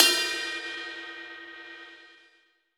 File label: Folk Ride Bell, Cymbals; Roland BK-5